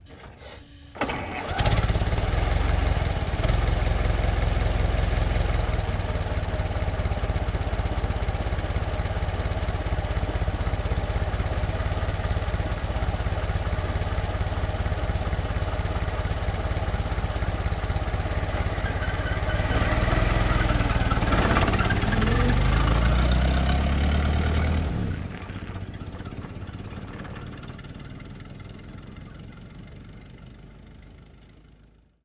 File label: 1924 Ford Stake Bed Truck Ext Start Idle Medium Away; Period Vehicles